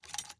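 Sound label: Inspect Shell Movement; Valorant; odin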